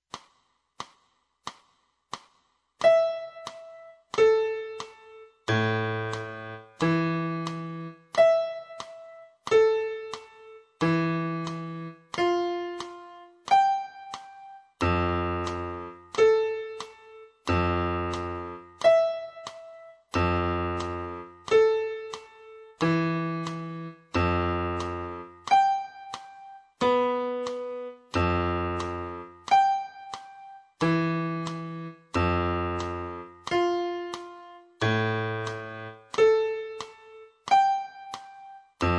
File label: piano